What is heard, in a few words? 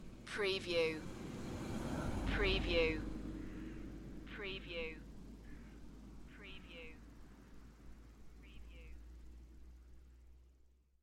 Car drive by on country road in Wales; beanstalk audio